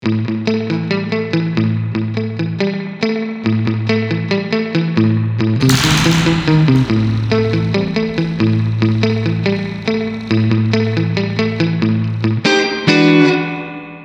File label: guit wet dry; dsk